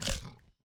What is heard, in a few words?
Raptor Bite Sounds